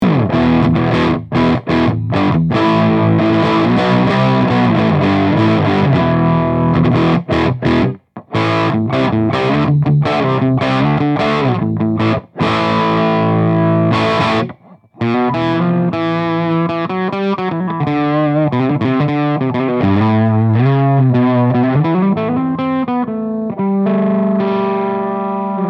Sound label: Metalish